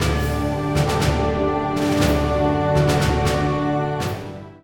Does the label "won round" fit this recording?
Yes